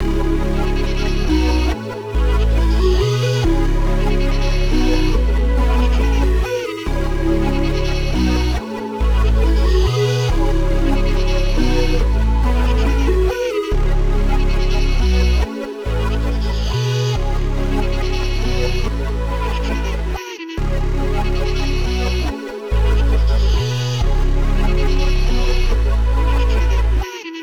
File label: 140 BPM C# Min, Virtual; Early Rager; Cymatics; Destiny Premium Melodies (BETA)